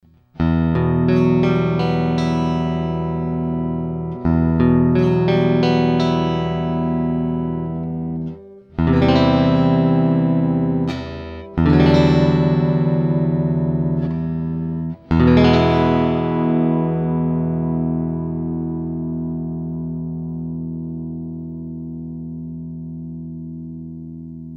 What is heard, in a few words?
half new strings